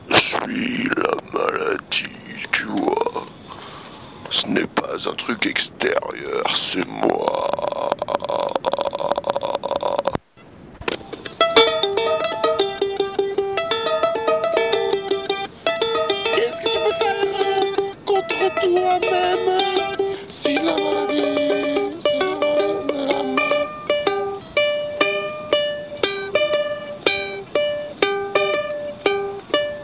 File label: charango